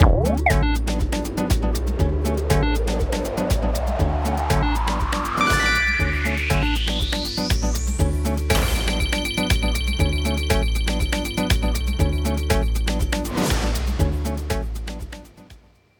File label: superwin; Lost Treasure; Wazdan